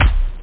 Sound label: hard bass drum; Aminet 21; GTI - Schatztruhe; Amiga 8-bit Sampled Voice; 8,363 sample rate